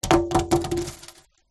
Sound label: polystyrene drop; the sound pack tree